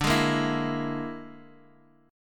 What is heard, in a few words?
A sharp 7th Flat 5th D